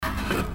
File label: mech move